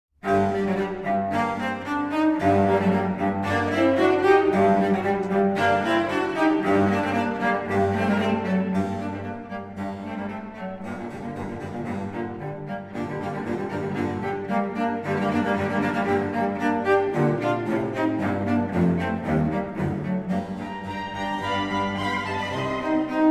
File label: Concerto For 2 Cellos in G Minor, Allegro